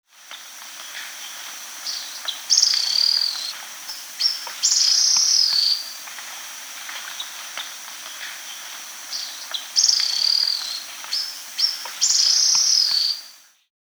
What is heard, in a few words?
Spectacled Bristle-Tyrant; S. America